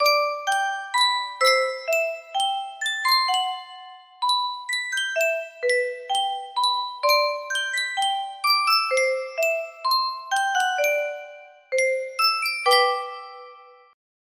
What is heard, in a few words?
Sankyo music box